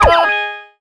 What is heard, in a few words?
Boss Hit